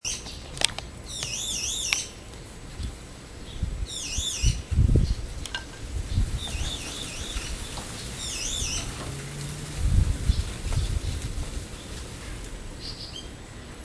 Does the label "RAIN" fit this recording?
No